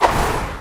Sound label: sword swing; dota; dragon knight